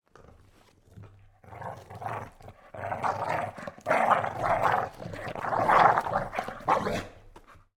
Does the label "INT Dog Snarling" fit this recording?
Yes